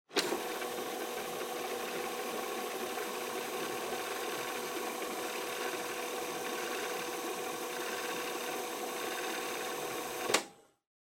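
Portable Stereo Driving Noise Tape Fast Forward Stop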